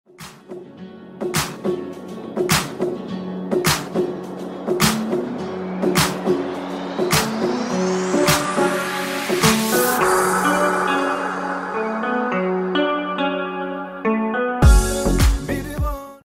desert iphone 8 ringtone

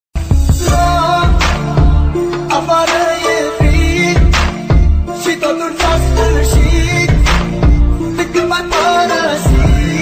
arabic ringtone

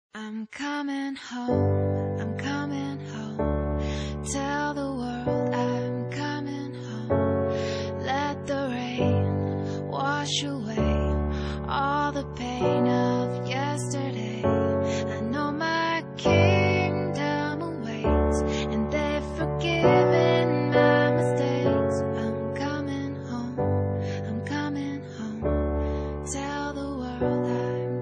new english ringtone

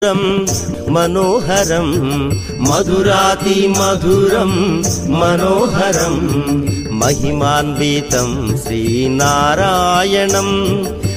mantram sanskrit ringtone

